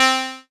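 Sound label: pluck C4, ARP Odyssey; Polyend; Simulacrum Sounds; 1 Shots; Synths; Keys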